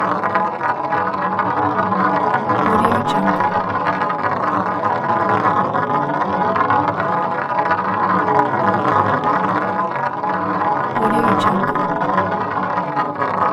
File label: big marble rolling continuous; lokohighman